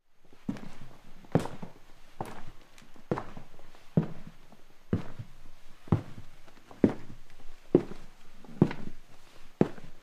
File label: footsteps